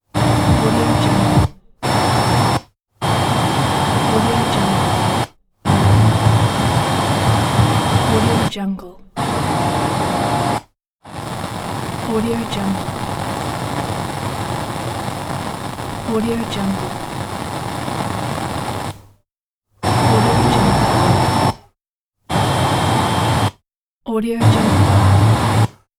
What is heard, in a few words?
radio tuning glitch